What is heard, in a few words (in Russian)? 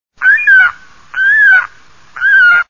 baloban